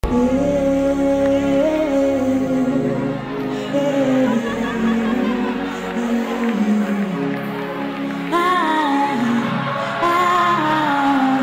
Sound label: Worship Chant